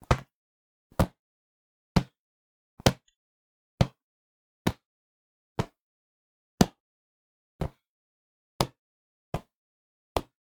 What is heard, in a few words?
volleyball ball grab; human; NS Studios - ultra sound collection